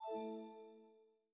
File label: chimes; GMM Windows 10